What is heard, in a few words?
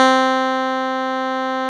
Juno Clavi; Club-50 - Foundations Roland; Clavinets